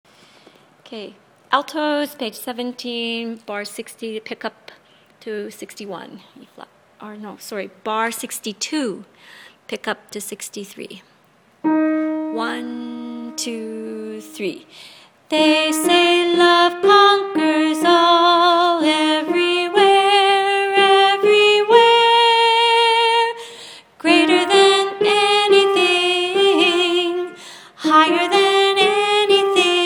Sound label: Altos